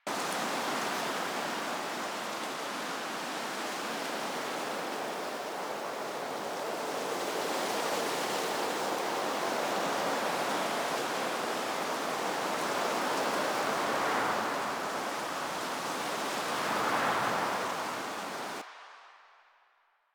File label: Tree Wind Loop; Ambience; Warframe; NineteenNinetyNine